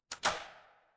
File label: door lock